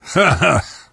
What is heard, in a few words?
laughter